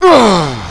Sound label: pain; hostage